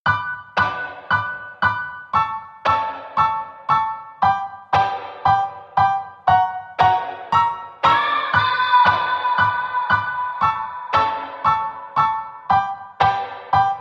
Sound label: Lightmix (Only Piano Guitars and Vocal Shout)